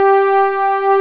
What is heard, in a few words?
SYNTH PAD G4; E-MU Formula 4000 Series Vol. 5; Protozoa; Proteus1 Instrmt